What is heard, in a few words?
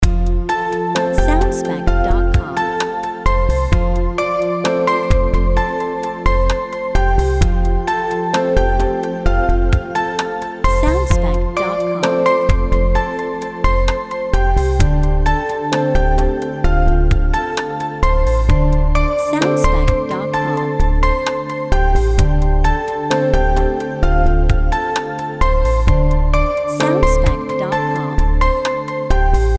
Lounge Sunday Afternoon